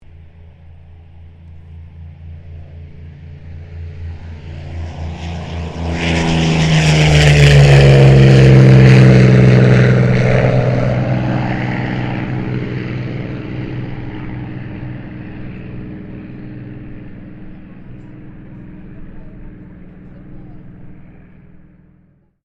AIRCRAFT P-40F KITTYHAWK TAKEOFF; P-40F Kittyhawk / Warhawk/Tomahawk; 1942; Airplane Sound Effects; Airplanes (Sound Effects:Vehicles)

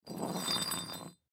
Brick Push Slide, Brick Push Slide Fast; Blastwave; Foley

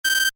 Computer Beep, Short; Multimedia; Stuart Duffield

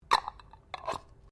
JAR GLASS LID METAL SCREW ON; Various kitchen jars; Twists; Objects

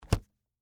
Foley, Bible, Set, Down, Book; Offices; Books

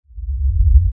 LFE Reverse, Lfe Reverse Element Swell Low Bass; Blastwave

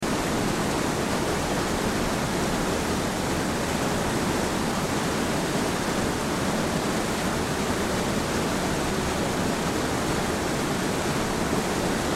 Canyon Rapids, Water Canyon Rapids Loop; River Sound Effects; Rivers (Sound Effects:Nature); Blastwave

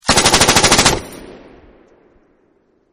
M240 Machine Gun Burst 3; Machine Guns; VRSonic

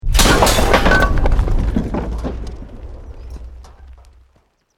BUILDING COLLAPSE SCAFFOLDING SMALL

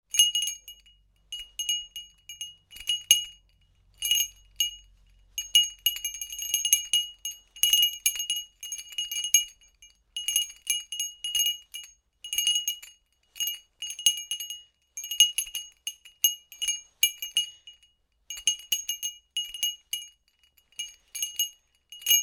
Small Bell Tinkling; Tom Hutchings

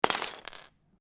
Lego, Single Lego Piece Drop Onto Pile Of Pieces; Miscellaneous Toy; Miscellaneous Toy Sound Effects

